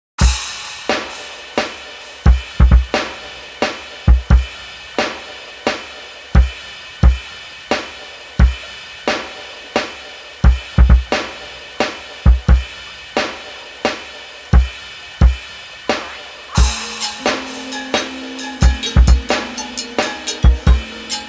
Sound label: music level garden; freedom fall